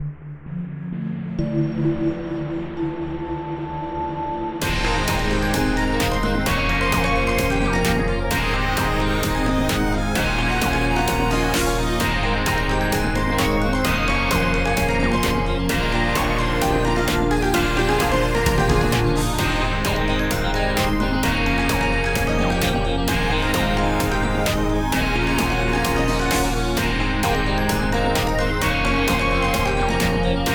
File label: Electro Poppin, edm; ニューエイジ; ファンタジー; BGM